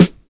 DISCO SD, SNAR; USB Soundscan vol.11; AKAI

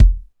BD DRY2, Korg Electribe EMX-1

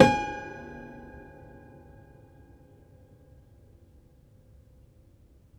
Prepared Piano; Battery 3 Sample Kits

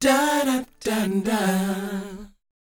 DOWOP D 4; ILIO; Vocal Planet

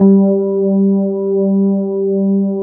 FENDER G2; USB Soundscan vol.09; AKAI; Keyboards Old School

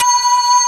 TABLURA

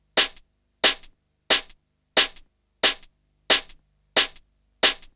Snare Loop

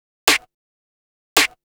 Snare, Trance; Sonic Foundry (Sony Creative Software); Trance NRG VOL-1; Drums

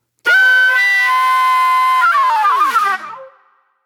Wild Flute; Sonic Foundry (Sony Creative Software); Manhattan Flutes

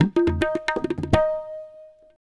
Rahiz, Percussion; Sonic Foundry (Sony Creative Software); World Pop

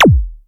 Yamaha DTXpress Electric Tom

